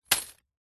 industrial small plastic bag of metal screws drop or set down; zapsplat